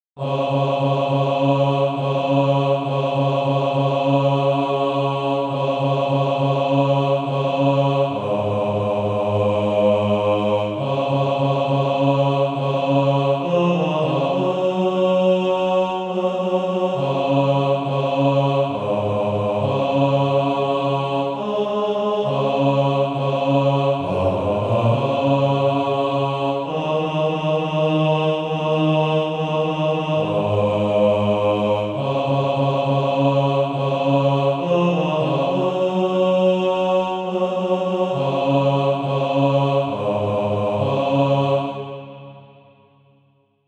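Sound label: bass, Practise Music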